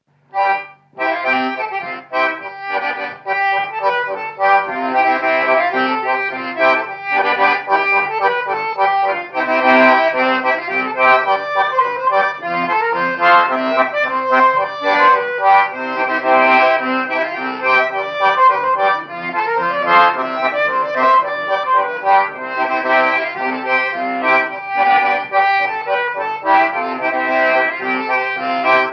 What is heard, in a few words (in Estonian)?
polka, loots folk; aiapapa